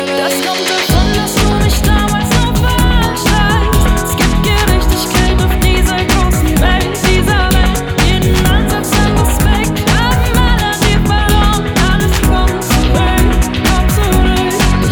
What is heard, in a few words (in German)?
Schlager female